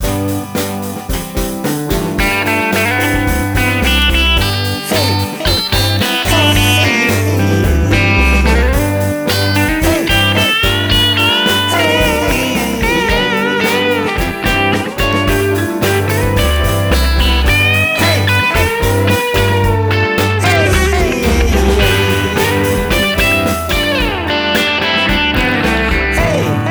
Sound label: Riff